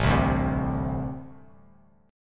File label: A-Minor Double Flat 5th A Bass Standard; strummed; JGuitar